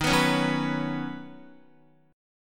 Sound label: Eb Minor 7th Sharp 5th Eb down Guitar; strummed